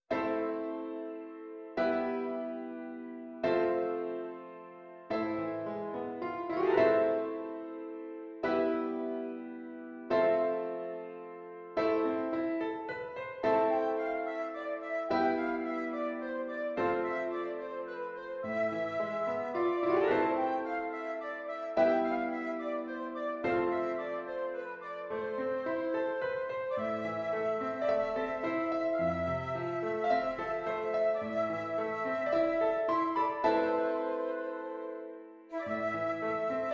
Prelude ORCH; Act 2

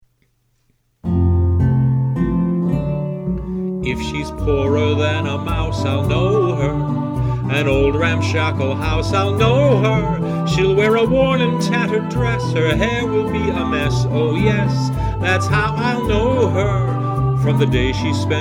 Vocal

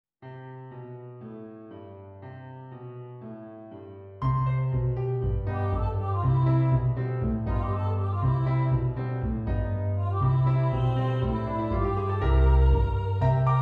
piano

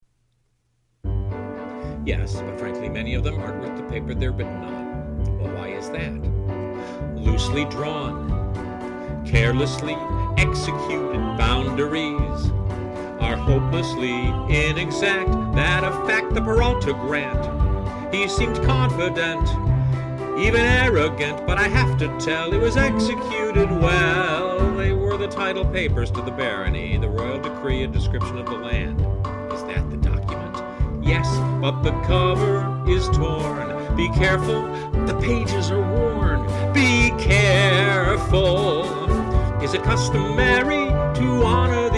Vocal

